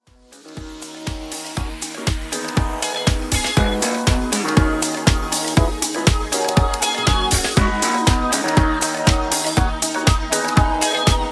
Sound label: 80s Redrum, 120 bpm